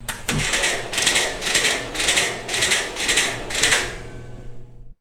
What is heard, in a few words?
short mechanical lift motor jolts